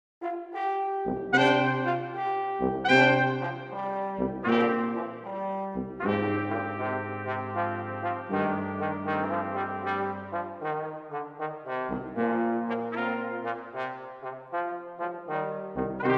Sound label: Three Medieval Carols, Brass Ensembles, Christmas; As I Laye on Yoles Night